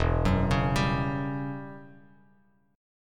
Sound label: E Minor 6th Add 9th E down Bass Standard; Em6add9 arpeggiated; JGuitar